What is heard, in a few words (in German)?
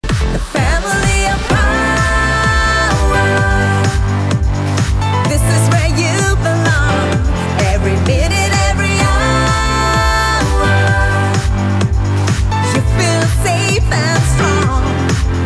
Klingelton; Android